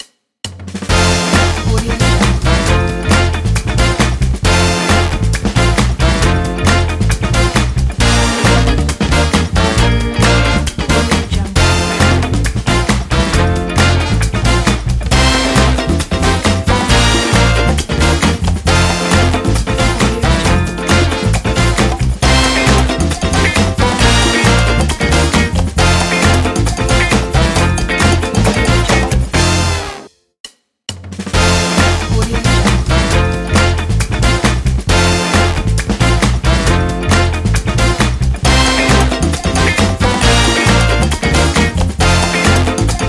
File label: show opener intro logo